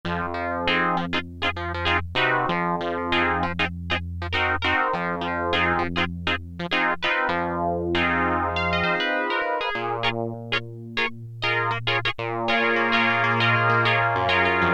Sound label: MAGIC CLAVINET, Oberheim OB-8; Factory Patches